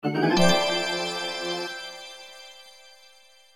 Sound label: Jingle Assist; Picross